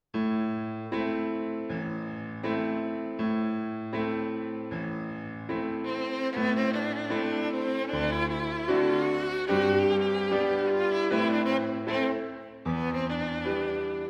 Romanian Folk Dances for violin & piano, Stick Dance; Bartók